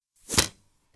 Arrow Impact

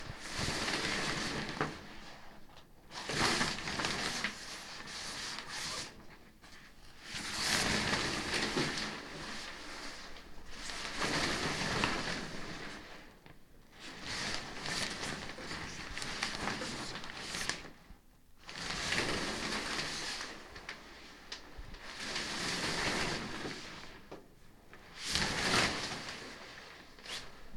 Curtain

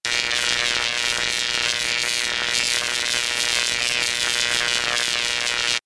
Electric Arc Phased

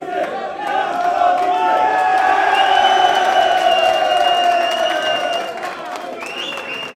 Massive Men Applause

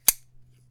Metal Click Sound

